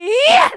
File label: middle attack, voice